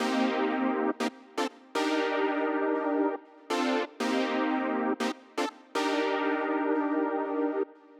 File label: Synth 120bpm Cm, Nu Disco; Zero-G; Construction Kits; Legacy